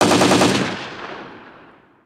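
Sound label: dist gun, distant gun, ambient outdoor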